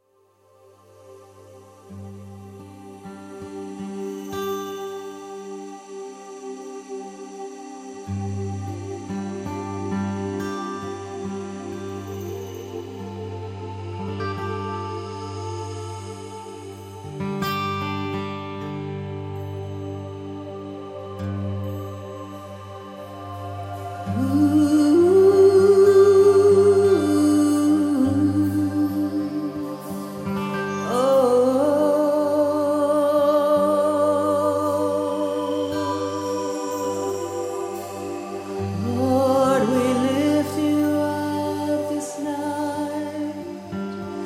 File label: Spontaneous Song